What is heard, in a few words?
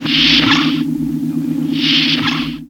swoosh; TOS